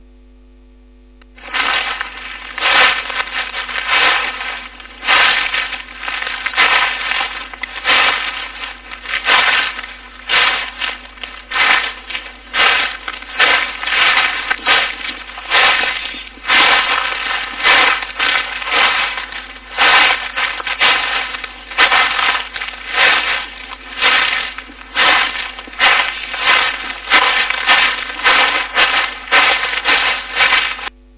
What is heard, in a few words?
leaves